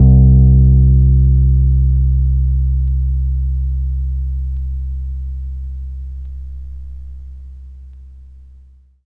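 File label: E PNO C1 MP; Synclavier Sampler Library; KEYS AND GUITARS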